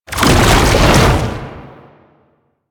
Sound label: Tank Infested Strafe Start, Movement; Warframe; Lasrian Tank